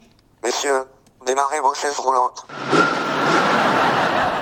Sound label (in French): demarrage chaise